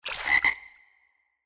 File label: frog Enters; Enchanted Prince 2; Eyecon